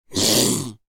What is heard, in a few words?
zombie